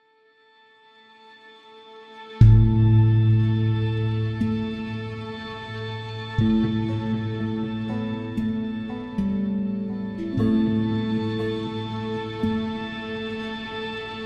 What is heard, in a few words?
violin